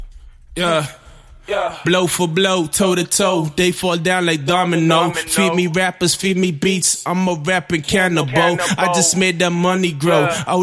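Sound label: acapella, HH 140 F sharp minor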